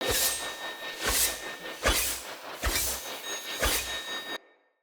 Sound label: Dagger Thrown Orbit Loop